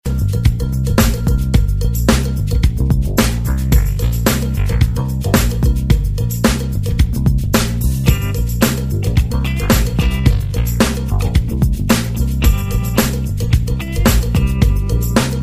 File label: Melody, RingTune